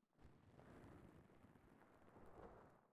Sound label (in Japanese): 膝枕; KU100